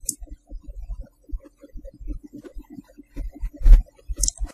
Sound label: noise clicks; postprocess